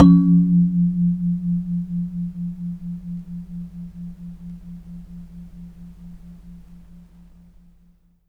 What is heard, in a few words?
fork